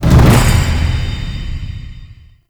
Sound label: Title Card